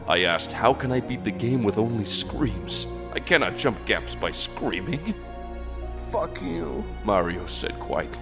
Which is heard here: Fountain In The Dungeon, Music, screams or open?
screams